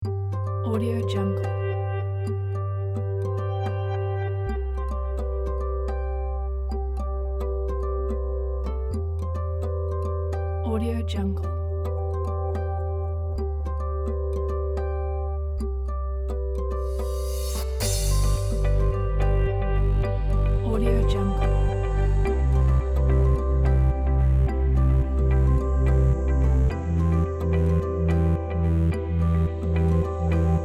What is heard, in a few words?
abstract slow motion